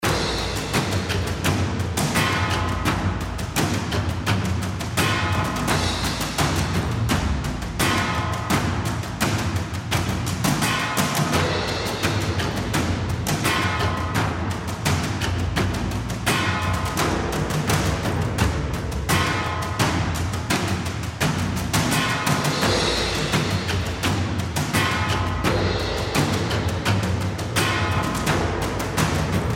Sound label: general Music layer; Champions of Valhalla